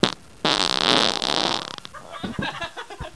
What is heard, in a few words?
fart